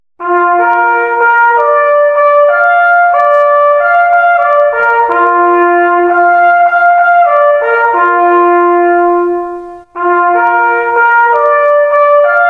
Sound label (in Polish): sygnaly Darz Bor